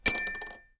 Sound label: coin